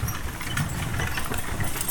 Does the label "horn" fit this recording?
No